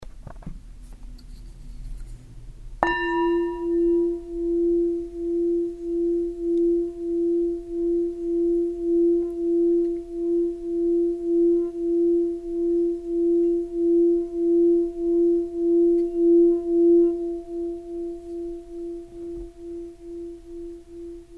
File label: ringing bowl, meditation; yoga meditation breathing stress reduction relaxing